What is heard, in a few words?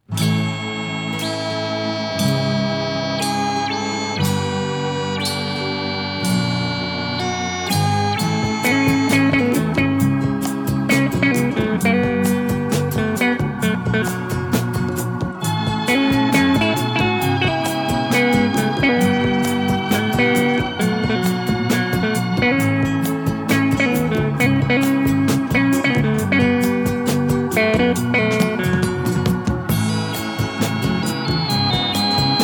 instrumental; persian